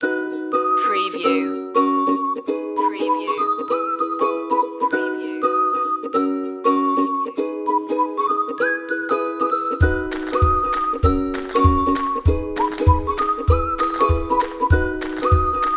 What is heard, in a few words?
happy folk